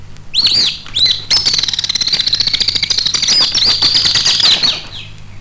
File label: Martinet chirping